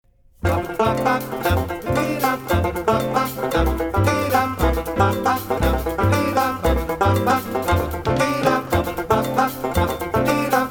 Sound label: Banjo